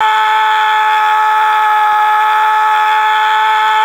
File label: high scream loop